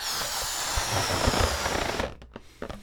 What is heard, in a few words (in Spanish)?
Globo Inflandose